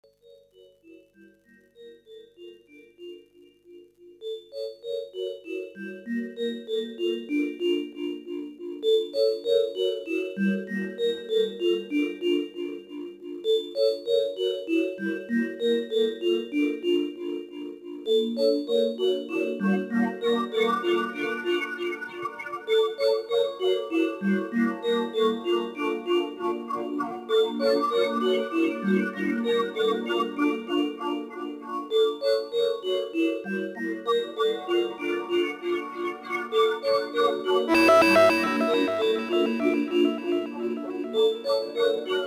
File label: buzz